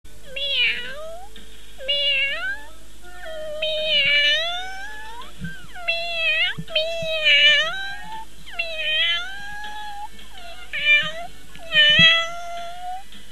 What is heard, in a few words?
meeow